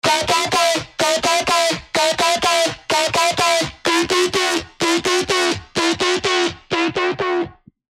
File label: SY Fill; revealed serum 2 bass tech house vol 1